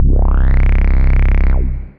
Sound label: Funk, Basses; Stadium Funk; Ableton; Creative Extensions; Racks